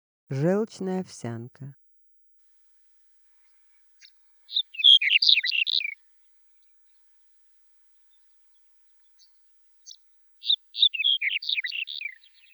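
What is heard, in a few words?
red-headed bunting voice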